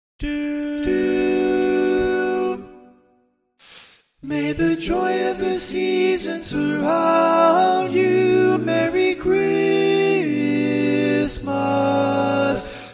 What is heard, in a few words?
Tenor, barbershop